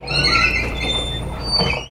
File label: Door Metallic Squea, push bar